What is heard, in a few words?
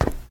tile step; Golden Crayon Sounds